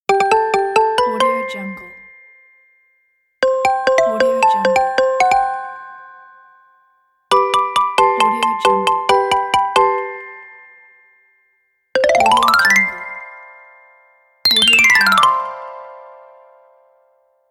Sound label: xylophone marimba notification pack